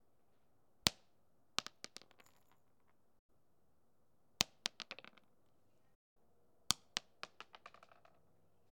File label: Stones Bouncing Off The Floor